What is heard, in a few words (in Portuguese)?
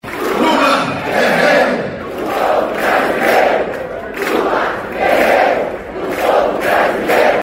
GRITO DE GUERRA